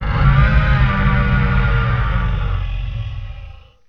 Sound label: Robot Monster Growl